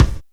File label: Kicks